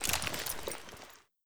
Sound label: toz66 draw; bm16